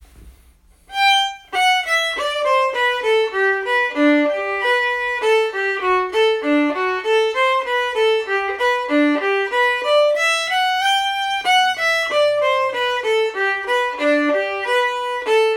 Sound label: reel montreal